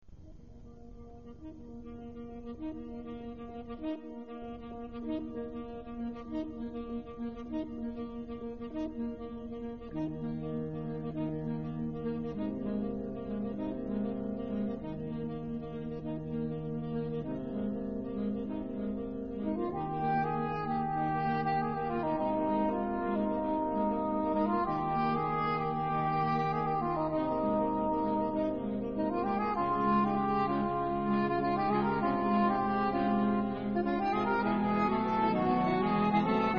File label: Sax Quintet; Safer Sax